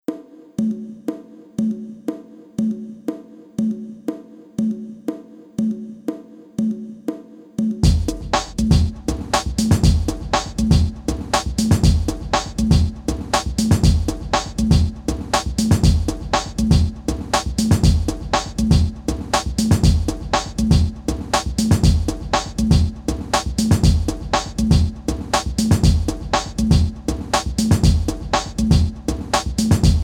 Only Bass; funk

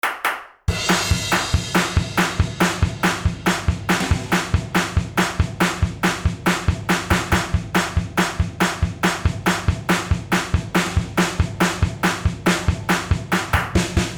only drum

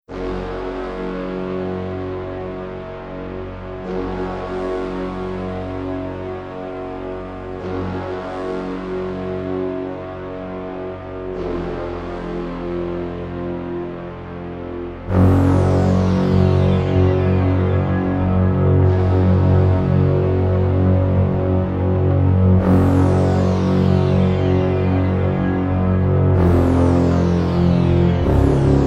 landing UFO, off melody

